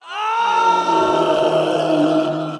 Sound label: human scream; cstrike; biohazard